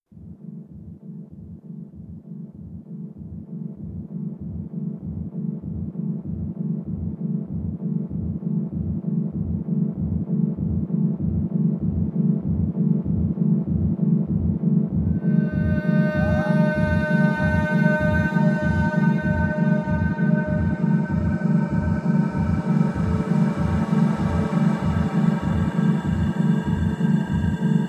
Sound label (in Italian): In trance